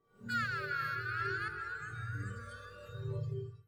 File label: Chimes